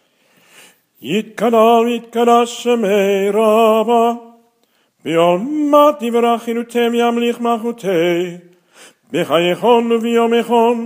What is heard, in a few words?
kaddish shalem